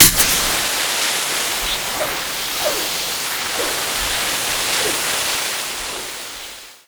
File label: flare start flare; Call of Duty Modern Warfare